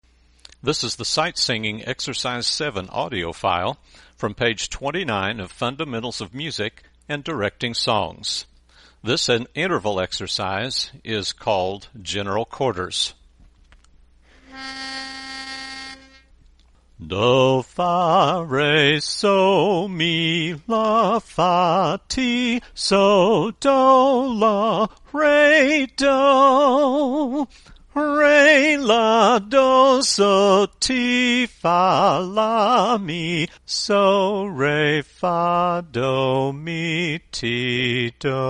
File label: Sight Singing